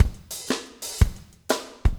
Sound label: motown 120, loops, 120bpm